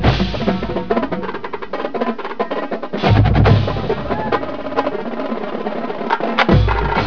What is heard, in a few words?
Bad ass tenor solo